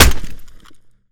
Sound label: keyboard hit, weapons, melee